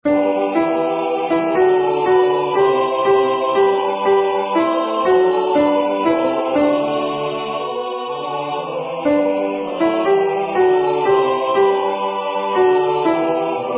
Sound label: Alto; Laudate Nomen Domini